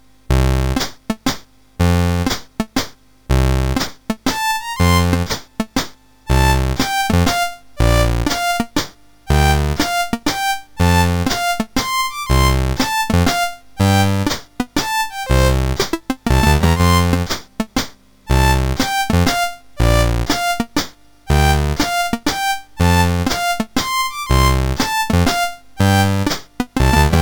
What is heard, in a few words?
Waltz, MOS6581R4